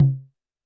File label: percussion, Roland TD-20